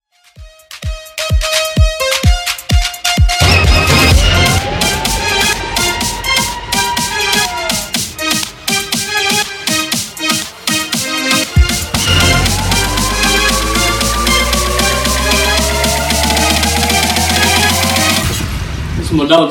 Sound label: Transition 128 110bpm